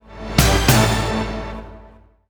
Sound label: level up; gesource